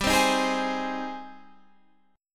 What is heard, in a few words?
Ab Major 7th, chord